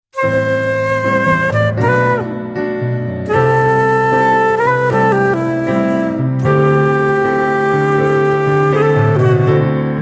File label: blues